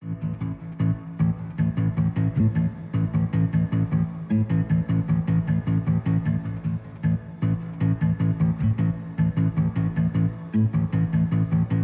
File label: NYLON FINGER MEMORY A MINOR 77 BPM